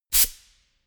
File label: pneumatic pump quick burst